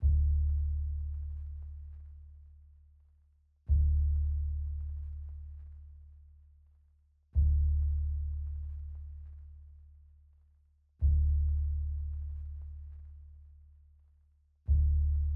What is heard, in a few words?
131 BPM D Min Bass; Trap Melody Loops; Loop Stems; Cymatics; Nightmares Trap Melody Loops; Last Call